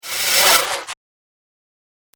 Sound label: Ufo Laser Swoop Sound; Spacecraft Sound Effects; science fiction sound effect